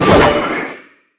evil potion; pvz2

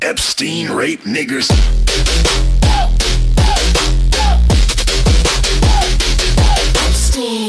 song

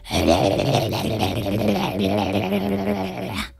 milk noises

